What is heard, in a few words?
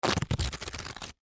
Perforated Paper Rip Tear; Materials; Office; Blastwave